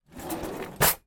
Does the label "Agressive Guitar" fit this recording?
No